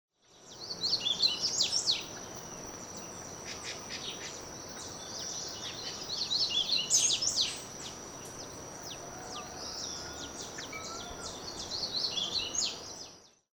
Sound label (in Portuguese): Coleirinho Sporophila caerulescens; Jacauna